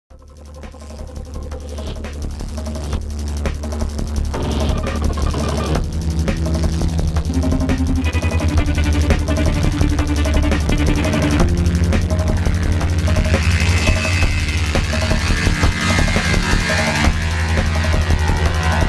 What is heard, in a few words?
Horse